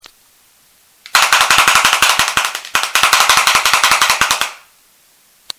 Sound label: Clappers